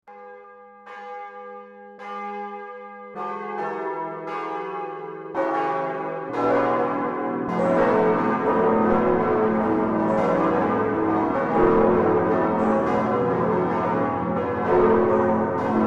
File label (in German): paderborner domglocken